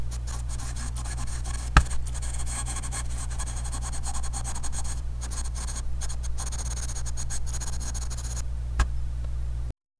cards

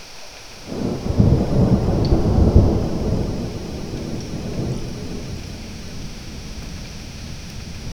realistic tropical night, frog croaks

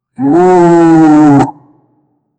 roar of a cosmic boar, mythical

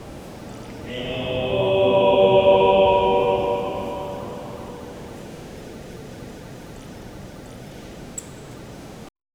a big crowd of people, cathedral

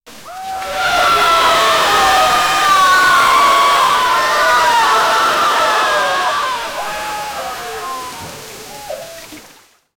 loud waterfall rushing so; disaster

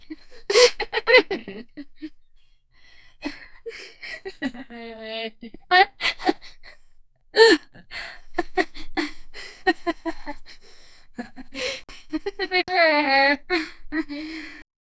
soft gentle laugh and hap; happy

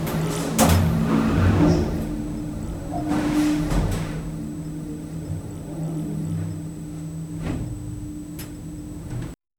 inside an elevator faint; metallic whoosh

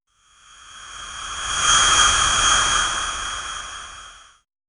short freeze ability soun

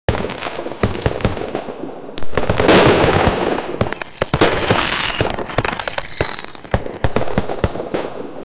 generate a 5 second firew, festive

